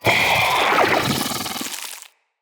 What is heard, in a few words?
Spider Elite Vocal Attack; Root Spider Elite